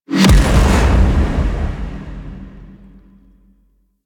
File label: Medic Bubble Explode; Warframe; Vehicles; RailJack; RailJackEnemyShips; Grineer; Space Fighter Medic Agent